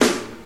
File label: snare drum sound F sharp key